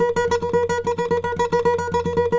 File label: NYL TR, FLAMENCO FX; Spectrasonics; Hans Zimmer Guitars VOL-2